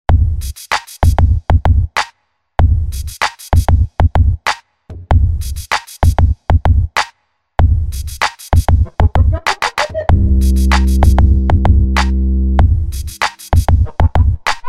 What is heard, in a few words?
The Beat; Soundzabound Volume 5